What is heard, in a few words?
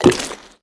impact; Smite; Vulcan